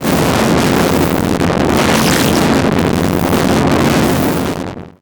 Static; Blastwave

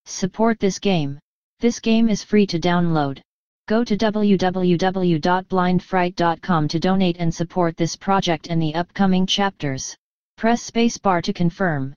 menu; DeadFright

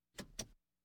Automobiles various

